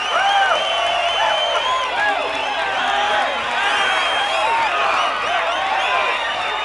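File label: Boxing Crowd Small Cheer Loop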